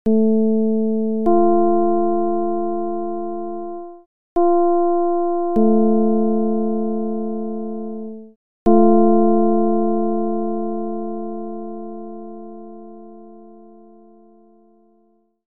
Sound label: csound foscil 220hz